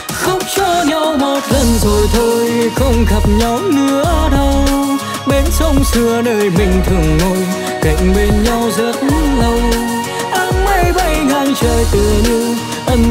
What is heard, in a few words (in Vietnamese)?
Nhac chuong